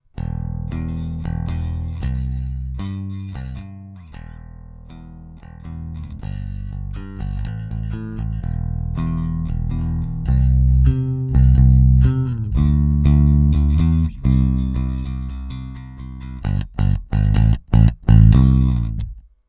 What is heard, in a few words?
equalizer sweep bass; Trace Elliot ELF